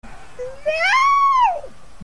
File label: girl scream; Chaos Says nooooooooooo